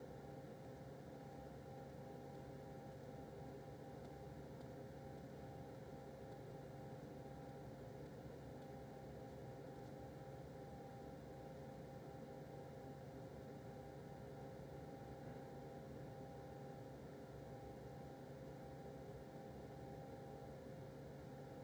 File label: amb jail kitchen; prison